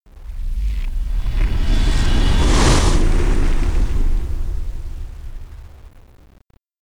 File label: Time Whoosh; Learn to Fly 3